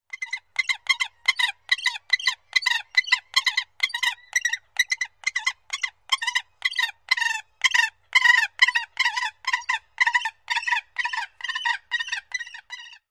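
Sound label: Gyrfalcon begging call of captive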